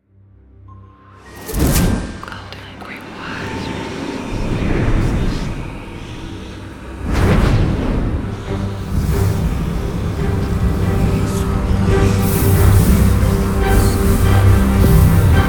intro Movie; goldengrimoire

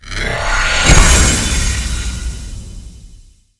scatter Diamond Burst; gorillakingdom

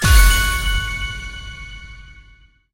medium Win; parthenon

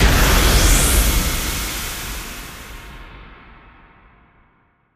big win start; pyramid

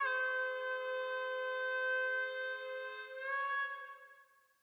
ambience harmonica; dead or alive 2

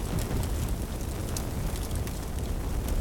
Win Steady Fire Loop; aloha

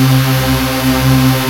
nearwin; spinsane

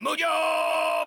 Cheer; eldorado

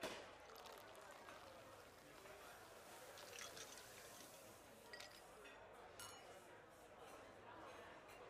ambience General; piggy riches

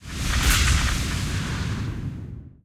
mega Win Attention; steamtower

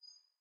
button hover; rise of maya

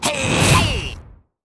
Light Attack; wild worlds

